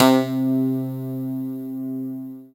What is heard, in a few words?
CLAV; East West - Phat & Phunky CD2; 90's Sample CDs